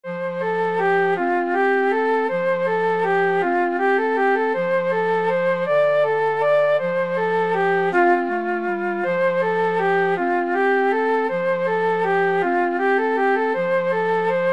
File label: flute clarinet duet